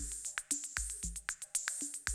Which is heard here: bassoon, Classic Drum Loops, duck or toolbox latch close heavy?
Classic Drum Loops